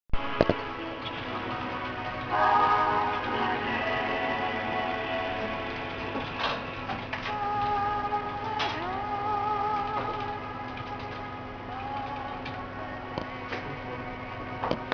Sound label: mailbox hold